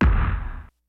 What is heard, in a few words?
KICKS; Kid Nepro; Elite; KICKS SNARES